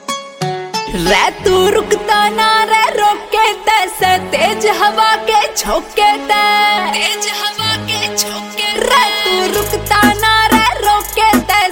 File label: Hindi Song Ringtone